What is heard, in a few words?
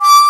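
taegeum D#4, Korean; AKAI S6000 CD-ROM - Volume 1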